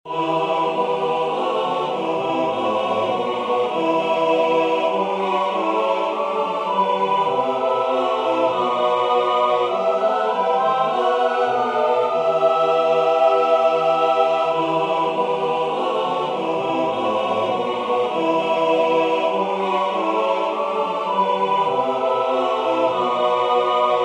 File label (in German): Choral; Jesu meine Freude